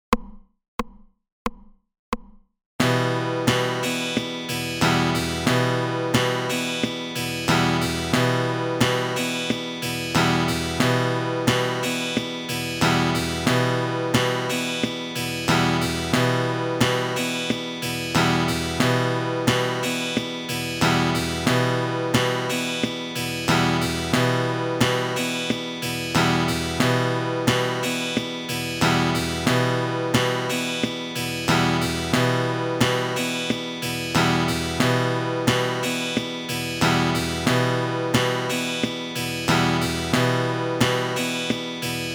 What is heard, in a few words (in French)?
Percussions pouce 90